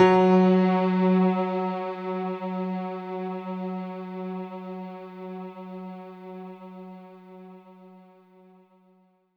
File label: F#3, synth; 90 s Sample CDs; NiceBeats; SynthLine VOL-23